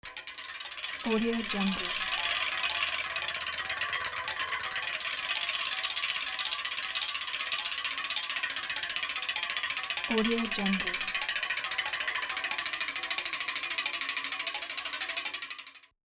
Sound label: bicycle spokes